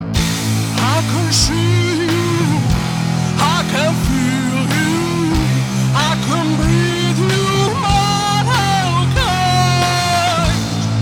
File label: Ringtone